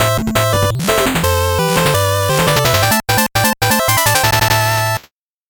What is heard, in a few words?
8bit jingle normal